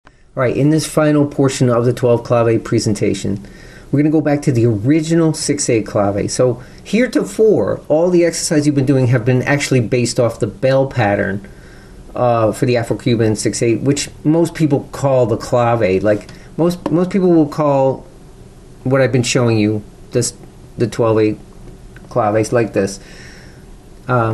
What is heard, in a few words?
12 claves original clave intro